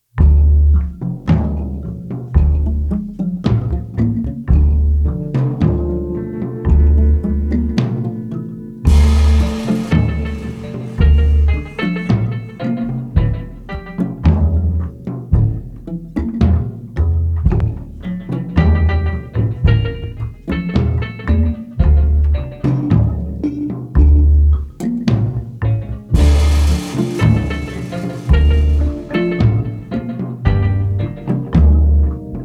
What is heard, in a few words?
Score Americana; Grumpy Old Captain; Verliebt in Masuren